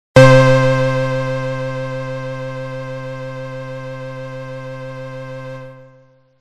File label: Three Pulses, synth lead